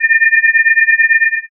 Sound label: forcefield